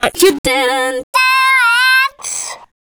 VOICE FX, Dance Vocals

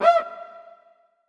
Mute Cuica, percussion reverb; MARKYDRUMS

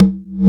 CONGA, DRUMS; Analogon

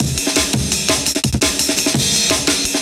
Jungle, Breakbeats

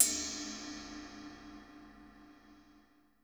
RIDE C1; Crypton - DrumBase5000; R70 KIT #1

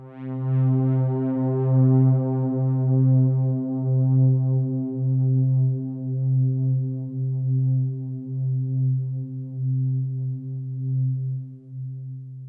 Pad C, Synth Prophet One Shots; Electronisounds

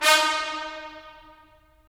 BRS, Tps Stacc; Roland - LA Composer vol. 4

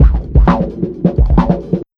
Big Beat Drumloops; PHASE 130; USB Soundscan vol.25; AKAI

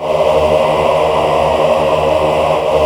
AAH, VOCAL

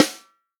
snare drum, HIPHOP SNARE CHROMATIC; Best Service ProSamples vol.43; Real Drum Kits

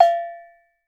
agogo bells; Voice Crystal - Steve Reid Definitive Percussion

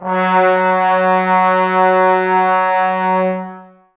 BRS TBS F#, Woodwind Brass Ensemble, Brass; Miroslav Vitous - Woodwind Brass Ensemble

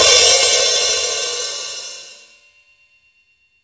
HH OP, Drum Dry; East Collexion; Drum 1 Dry

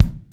KICK, LA KICK; AKAI S6000 CD-ROM - Volume 3

